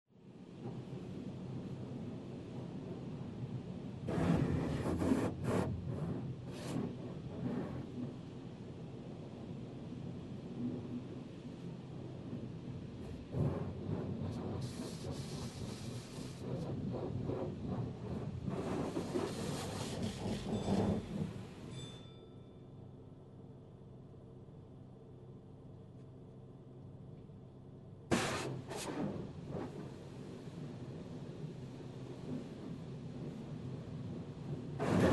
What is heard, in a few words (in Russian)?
zvuki avtomojki